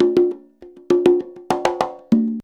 CONGA, Modern Percussion Loops

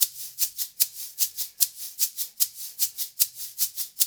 loop, percussion; InVision Interactive

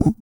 Vocal, Blues & Soul; CUICA MAN

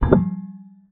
pedal, special fx; Xsample VOL-1; 90 s Sample CDs

